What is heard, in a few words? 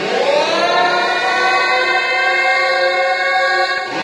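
alarm sweep loop large; video games; ambient; source engine; portal2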